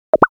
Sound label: game two fail